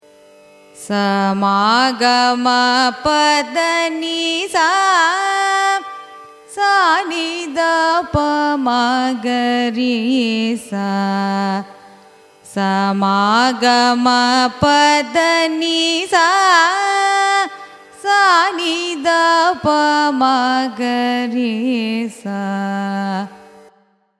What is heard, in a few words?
raga khamas arohanam avarohanam; carnatic music